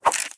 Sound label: Fan; Cut the Rope: Time Travel